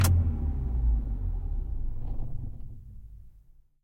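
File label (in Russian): тихий звук закрывающегося дверного автомобильного замка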